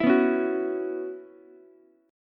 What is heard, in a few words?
Gb 7th Suspended 4th Gb, Ukulele; strummed; JGuitar